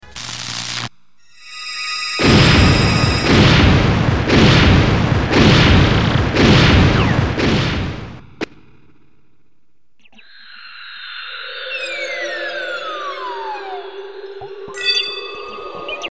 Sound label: explosion; Paper Mario 2 The Thousand Year Door